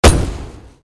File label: attack impact; Li Li Shadowpaw Sound Effects; Li Li Heroes of the Storm Responses; warcraft